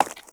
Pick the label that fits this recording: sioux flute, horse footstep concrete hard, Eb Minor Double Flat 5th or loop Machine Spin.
horse footstep concrete hard